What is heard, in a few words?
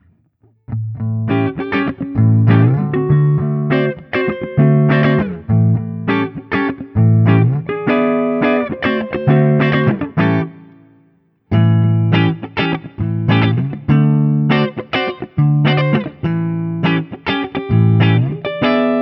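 Guild Starfire I SC ODS100 HB 7th, guitar; Guild Newark St Starfire SC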